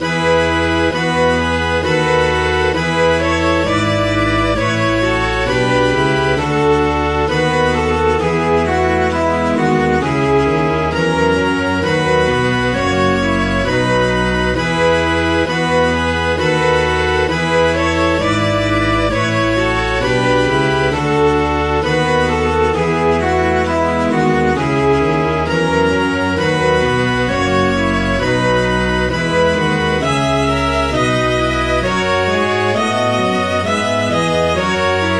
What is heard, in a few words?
MIDI